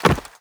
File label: body impact